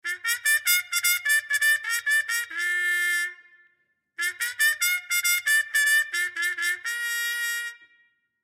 Music First Call, Music First Call Horse Race Muted Trumpet; Brass; Musical